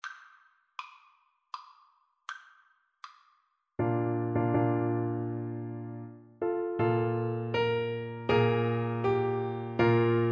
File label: mendelssohn nocturne, Tuba